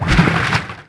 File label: Water Step